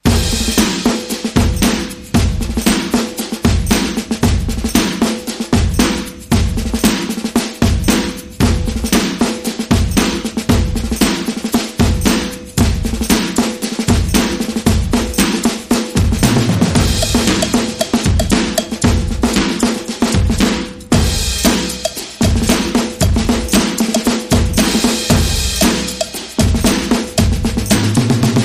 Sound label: Military Funk